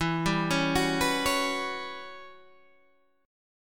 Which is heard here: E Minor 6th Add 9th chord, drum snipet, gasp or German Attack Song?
E Minor 6th Add 9th chord